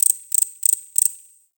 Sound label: Forest Close Insect Chirp, Ambience; Warframe; Grineer